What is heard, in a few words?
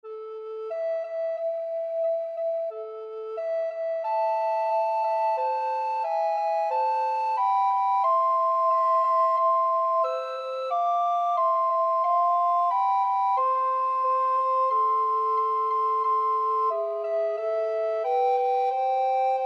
2 alto recorder, alto recorder duet